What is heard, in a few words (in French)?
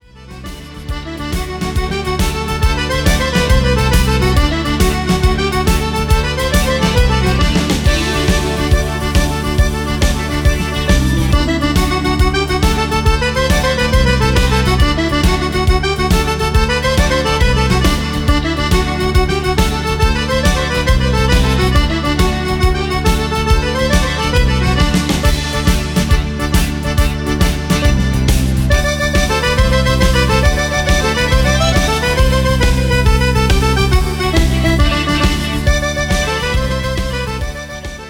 gigue